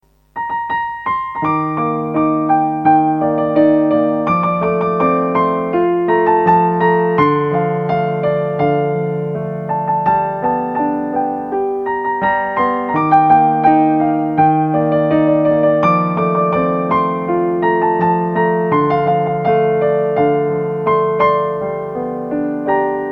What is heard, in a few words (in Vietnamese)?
Piano